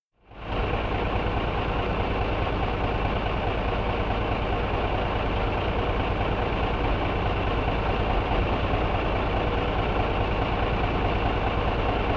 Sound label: Tractor engine stationair; KMP Film Video Sound Effects